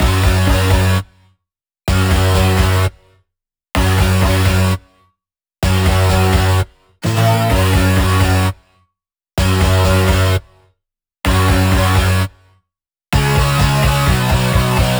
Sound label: Special Sounds Root F# 128 BPM; Vengeance Samples; VEH3 Special Sounds